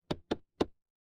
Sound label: impact wood knock